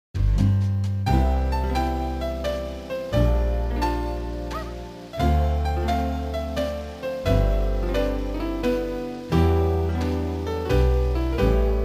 Café music

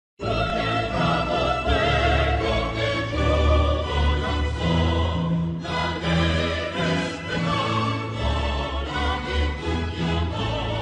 Venezuelan anthem

